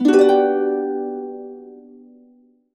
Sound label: harp chords, G maj7; USB Soundscan vol.62; Complete Harps; AKAI